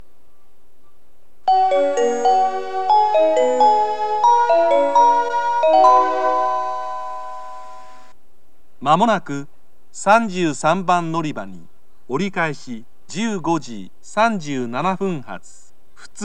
turn local, station, Kyoto; sonobe

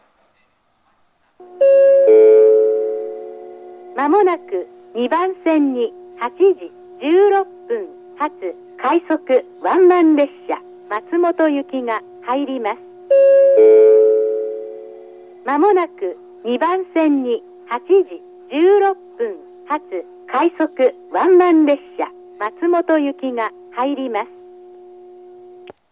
rapid; obasute; matsumoto; jr-east

